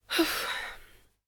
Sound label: alarm create female; interface